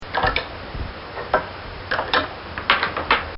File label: Hooking Lead Rope To Halter